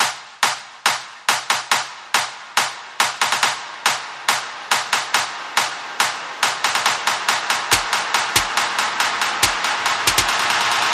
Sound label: percussion bass and effects